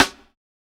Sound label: snare rim roots